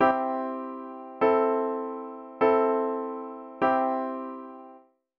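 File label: Secondary dominant exercise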